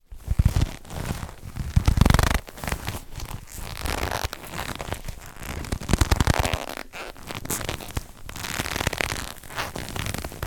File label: foley leather wallet mvoements squeaks